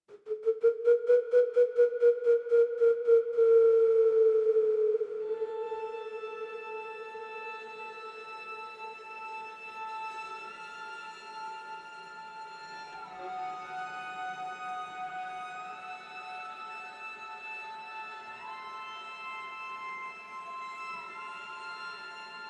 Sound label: exploration night stringflute